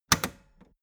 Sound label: old button hard snap push